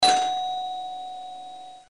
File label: Bell, ringtones